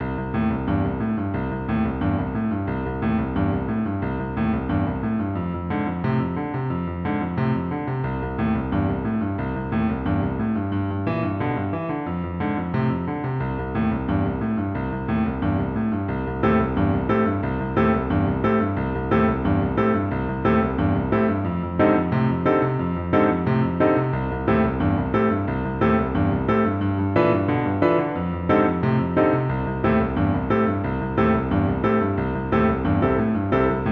Exercise on Left Hand Pattern

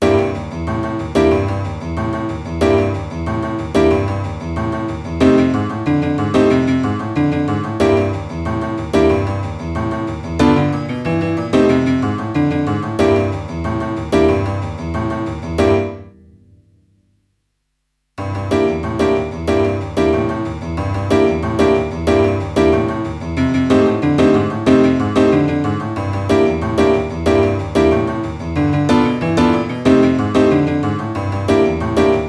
Boogie-woogie Exercise

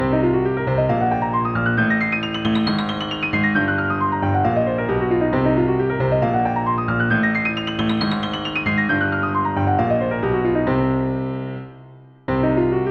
6-note Minor Pentatonic Blues Scale Exercise

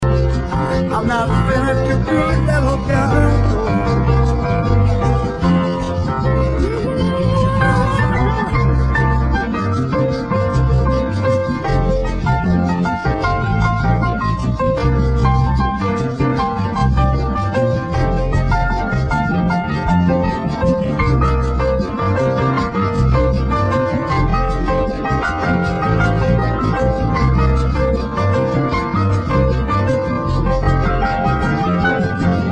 Solo & Break

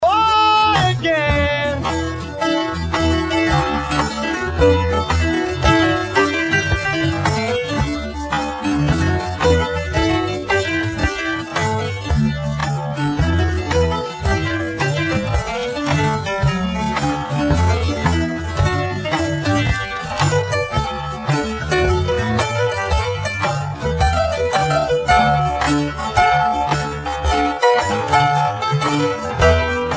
PIANO SOLO

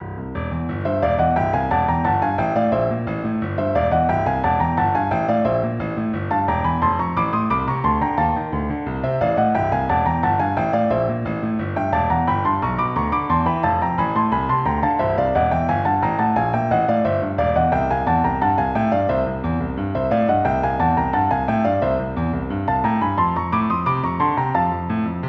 Boogie-woogie Double-thirds Exercise

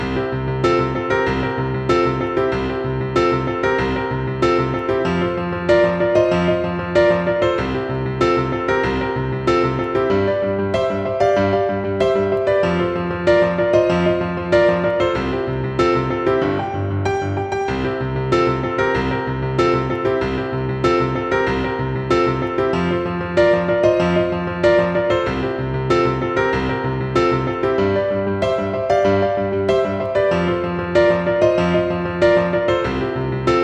Paradiddle Exercise

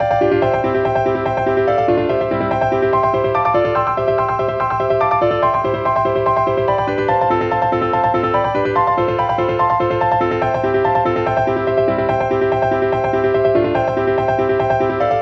Exercise for the Wrists Banjo on the Piano

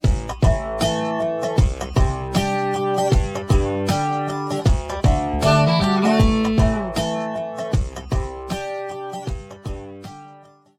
Elec Piano Riff

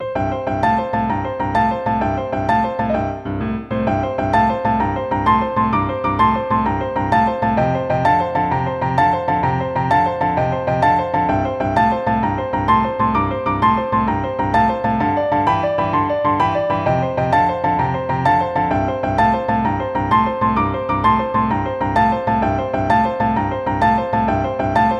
Thumb-Pivot Exercise

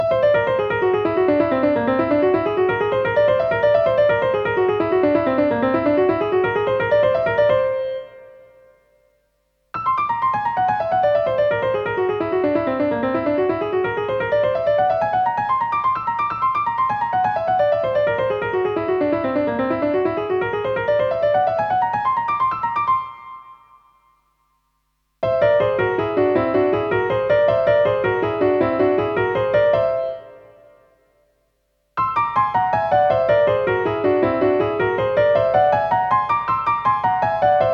Exercise in Whole-Tones

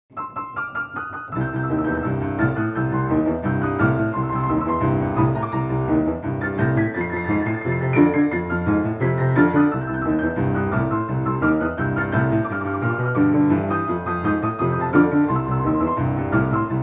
Tremolo Boogie

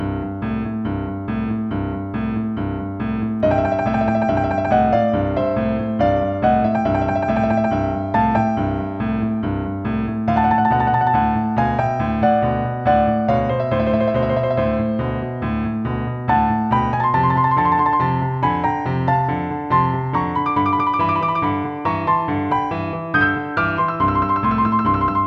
Boogie Exercise - Tremolos & Thirds

